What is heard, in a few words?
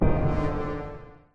Rebloom Drum; Musician